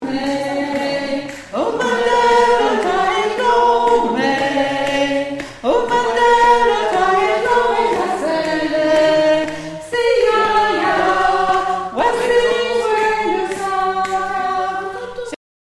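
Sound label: Soprano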